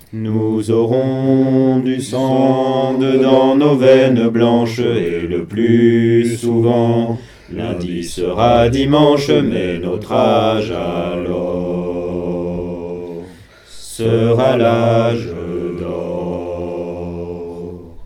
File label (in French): GRAVE, Chansons, voix; L age d or